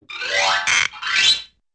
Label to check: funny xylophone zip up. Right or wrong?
Right